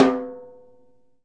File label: Pic mf Rim; Digital Sound Factory